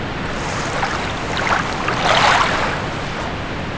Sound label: ocean lap